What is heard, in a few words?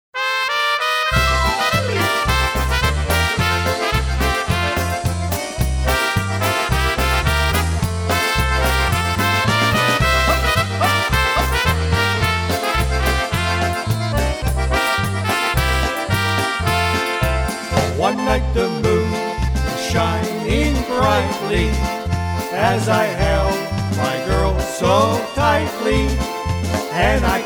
Polka